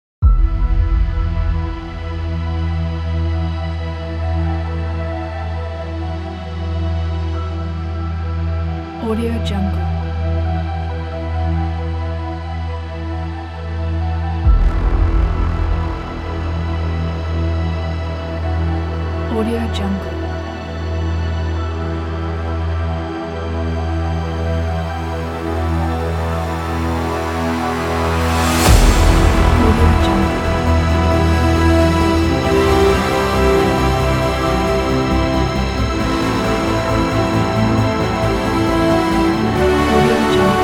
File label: cinematic trailer